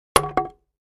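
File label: 2x4 Smashed Sledge Hammer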